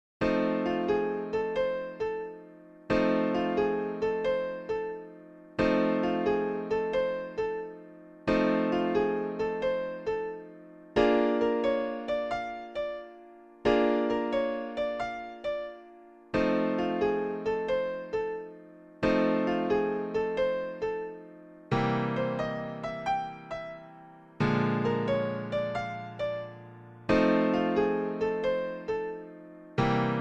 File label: Simple Blues In F; 16Blues